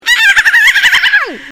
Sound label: dolphin echo